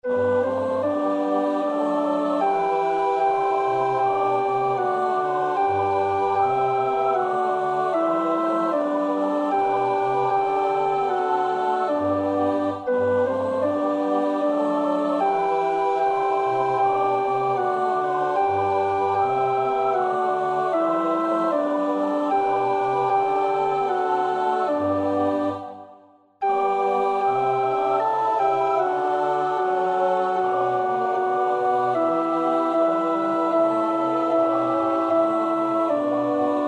alt, koorpartij oefening; Bach (Johann Sebastiaan); BWV 099

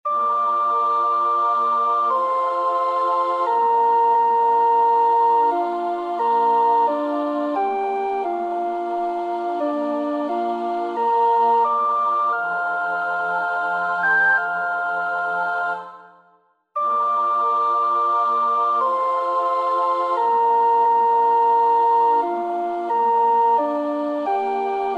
Sopraan 1, koorpartij-oefening; Wagner (Richard); Senta's Ballade

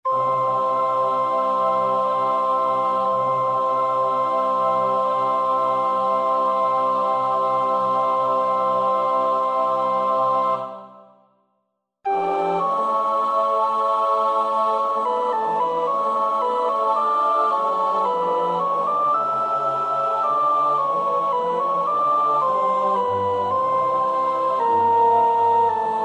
sopraan 2; Engelse tekst; Rachmaninoff (Serei); Vespers Op.37

